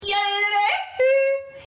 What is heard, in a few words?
climb jodel